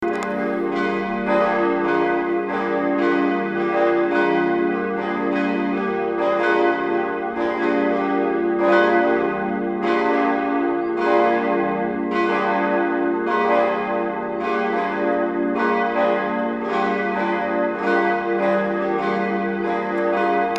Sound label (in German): franziskuskirche glocken